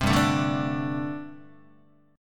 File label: G Suspended 2nd Suspended 4th, Guitar; Gsus2sus4 strummed; JGuitar